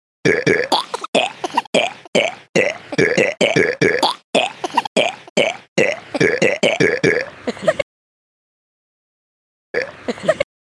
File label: burp